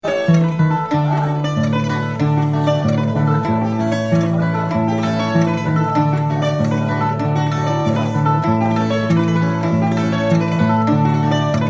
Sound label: fado guitar bass portugaise, lisboa; portugal